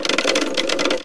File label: Plug Winch; Playable Tutorial Level; TR3